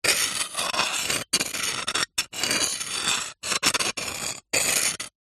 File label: foley glass sharp broken piece scrape scratch another